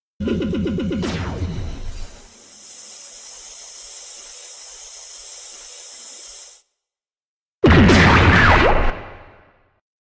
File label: gear 2nd